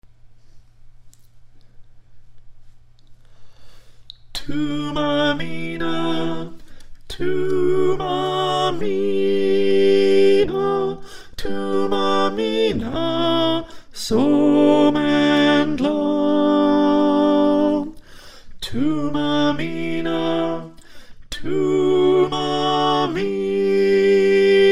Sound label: Alto